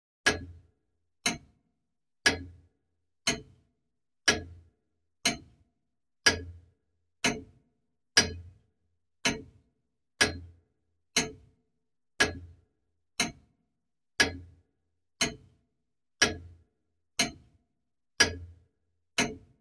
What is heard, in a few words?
Grandfather Clock Tick; blindside